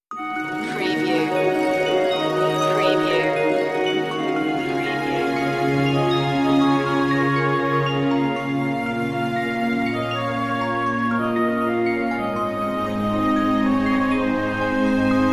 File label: heartbeat